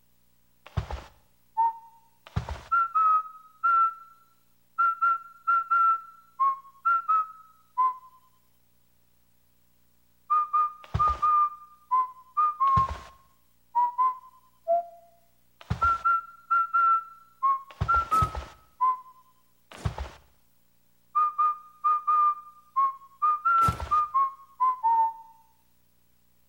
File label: Whistling; Luigi